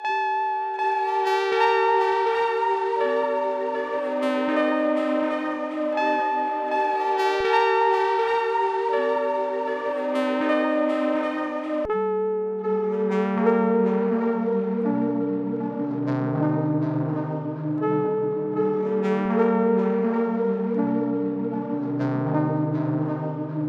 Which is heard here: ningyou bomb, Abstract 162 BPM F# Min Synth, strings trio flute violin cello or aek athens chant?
Abstract 162 BPM F# Min Synth